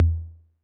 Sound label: Tom Low 808 Sat, Saturated